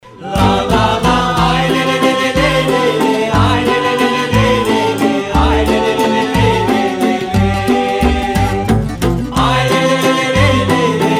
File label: Vals